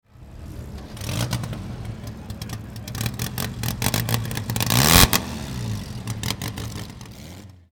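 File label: Austin 7 Special, engine; 2003 Skope Classic Ruapuna Raceway, Christchurch, New Zealand 1-2 February, 2003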